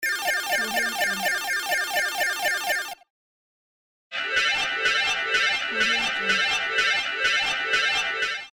slot machine win

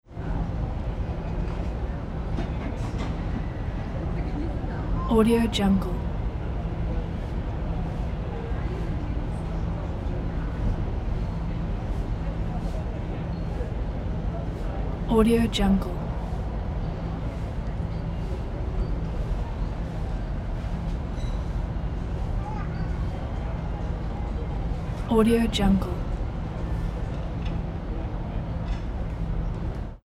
city main square midday short ambience

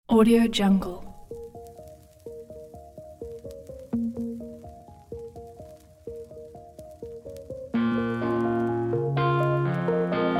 calm working days

